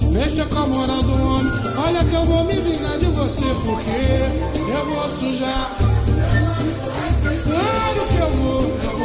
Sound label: break, samba